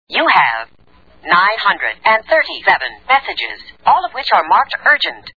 E-Mail Urgent

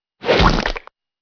splat

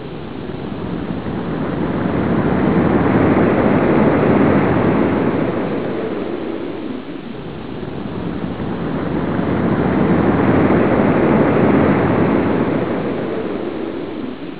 ocean waves

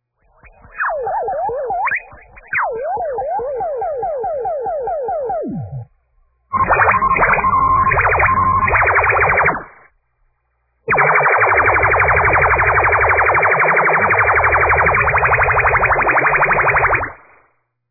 photon cannon

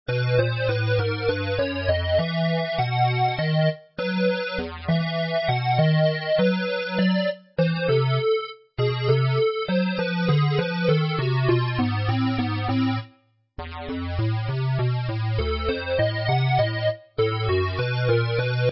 Dark Forest; Spookymidis

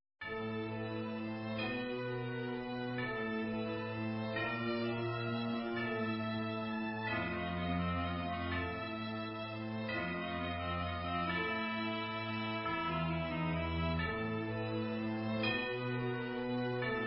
waltz, Midis

